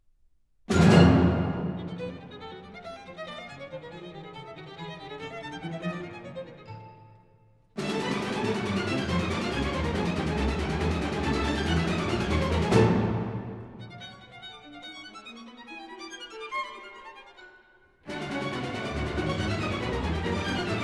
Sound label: Serenade, for violin, harp, percussion & strings; (after Plato： Symposium)